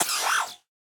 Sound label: glitch tech short